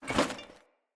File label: Foley Action Armour Melee Swipe; Player; Deora Armour